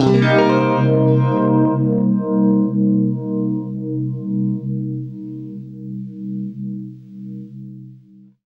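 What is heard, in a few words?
univibe Cm7 left, guitar; East West; Steve Stevens Guitar Samples Collection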